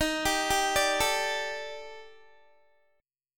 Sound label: D sharp Major 7th Flat 5th D sharp Guitar, chord; D#M7b5 arpeggiated